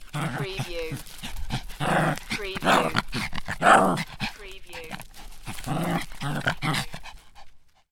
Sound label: Animal Small Dog Growling